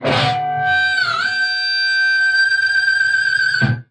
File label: squeal like a pig guitar; GUITARS